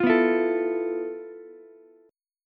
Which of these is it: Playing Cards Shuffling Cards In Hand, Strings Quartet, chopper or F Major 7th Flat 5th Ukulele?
F Major 7th Flat 5th Ukulele